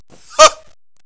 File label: huh